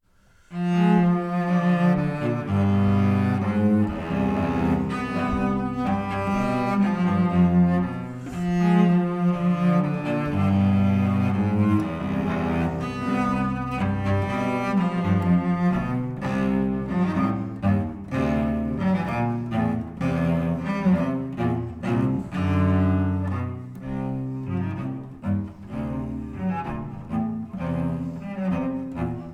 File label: cello duo